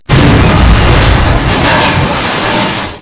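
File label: SFX TRAIN CRASH; Blood: Fresh Supply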